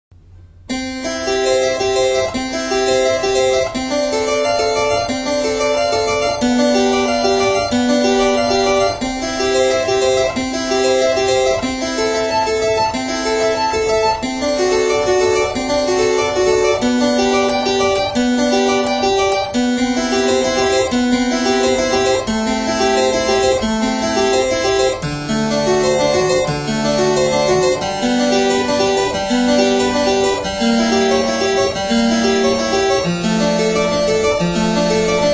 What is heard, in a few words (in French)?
grand jeu